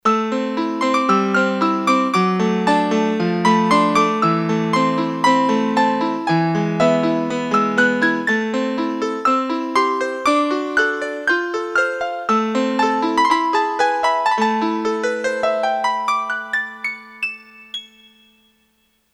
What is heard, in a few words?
4' Piano, Korg DSS-1; KSDU-013 Combined Groups